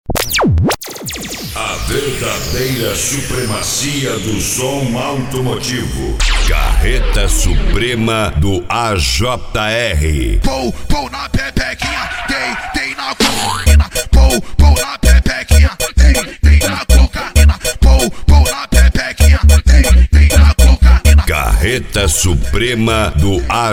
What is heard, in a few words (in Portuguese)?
carreta suprema funk